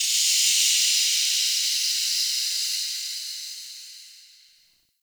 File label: Relay Steam Blast; Warframe; Ambience; Hub; Tenno Junction Relay